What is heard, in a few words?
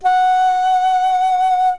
F sharp 5 trill, flute; modern